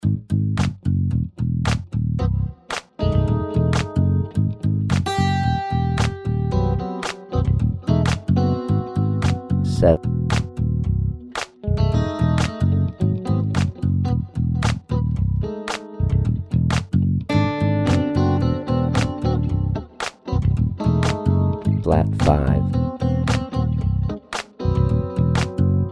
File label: Ab Phrygian b4 Direct Application Degrees 12String; Harmonic Major; direct application ear training